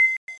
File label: menu hover